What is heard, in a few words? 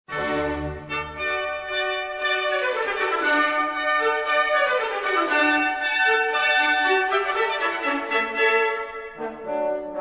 Andante (Allegro) Recessional; Handel